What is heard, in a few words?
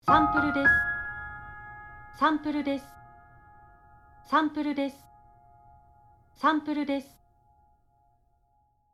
Piano fast